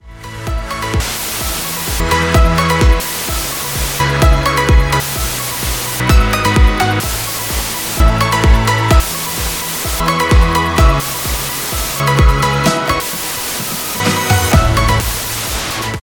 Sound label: disto